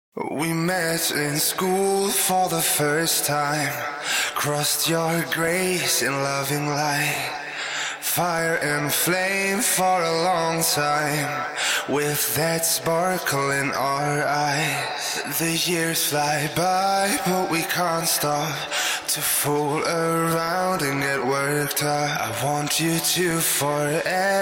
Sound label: edm, 128, Bm, acapella; Heart